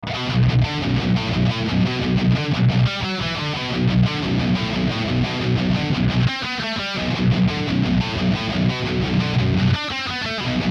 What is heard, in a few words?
Kemper Profiler Stage Diesel VH Four